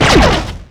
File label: vwing shoot; gmod; lfs v wing v 19